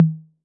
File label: Tom Mid A 808, Clean Digital